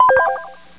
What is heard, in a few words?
BUTTON